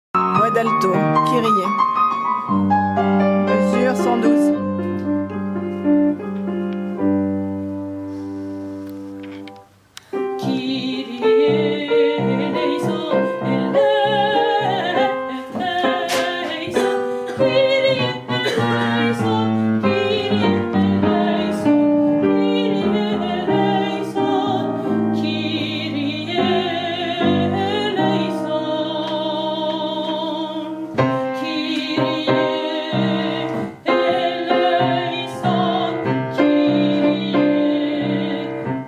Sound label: alto; kirie